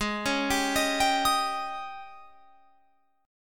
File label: G sharp Major 7th Suspended 4th G sharp, Guitar Open F; G#M7sus4 Chord